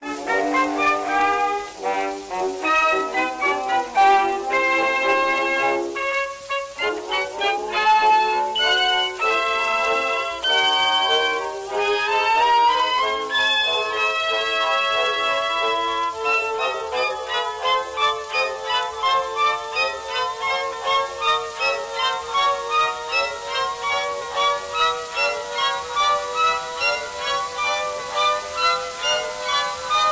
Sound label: Creepy radio music slow down static; Phasmophobia